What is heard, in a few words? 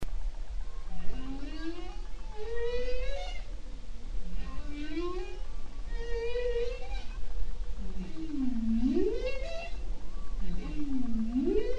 chukchi bowhead; Bowhead Whale; December 19, 2009, Chukchi Sea